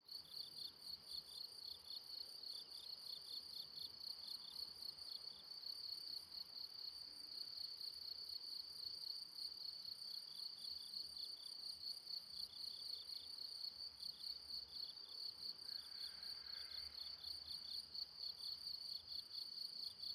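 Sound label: Gedres Criket Atmos, field recordings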